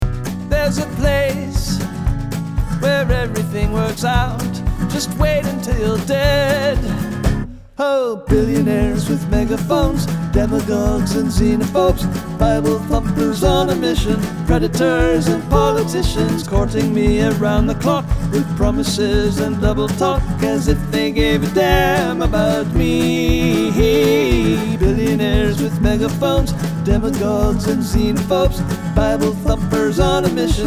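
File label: final chorus with harmony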